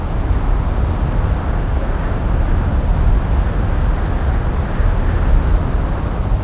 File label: BG Sand Storm; blue archive